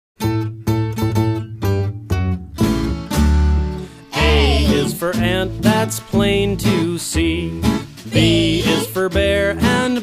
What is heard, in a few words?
Animal Alphabet Song